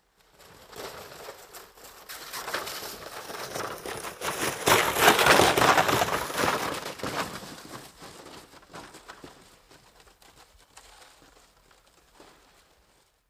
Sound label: sledge is passing by; snow